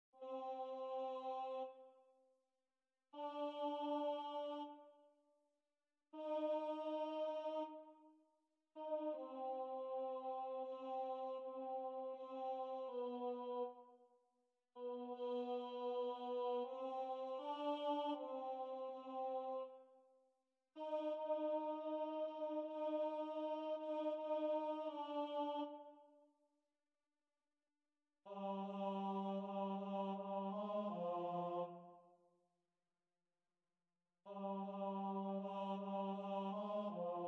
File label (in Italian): coro tenori; Idomeneo